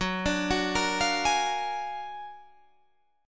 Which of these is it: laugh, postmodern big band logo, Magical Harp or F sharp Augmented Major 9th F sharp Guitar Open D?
F sharp Augmented Major 9th F sharp Guitar Open D